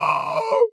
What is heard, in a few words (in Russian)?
udar